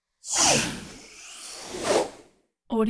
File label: cloth whooshes sharp whooshes; transitions and movement